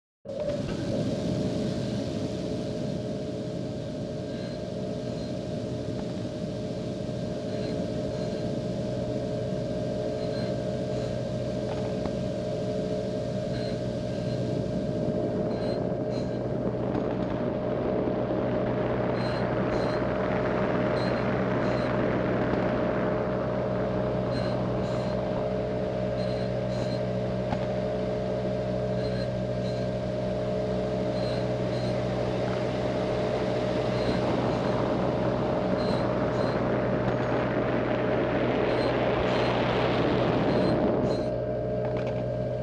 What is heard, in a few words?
gas grinder